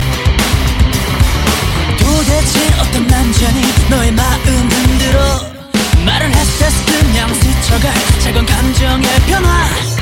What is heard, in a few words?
level up; christian